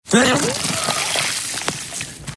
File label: Puke; Fourth and Long; Michael Irvin